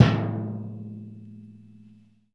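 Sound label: BIG TIN, percussion metallic; Rarefaction; Poke in The Ear With A Sharp Stick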